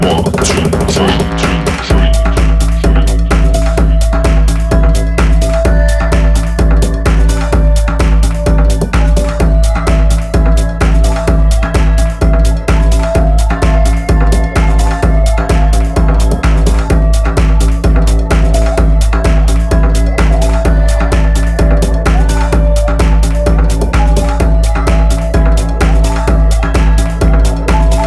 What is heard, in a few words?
bass kick 128